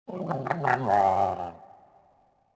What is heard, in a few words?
creature, ambience; yavin